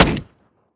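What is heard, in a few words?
cpapa fire player, weapons; gmod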